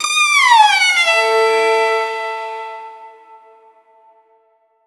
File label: violin fx pluck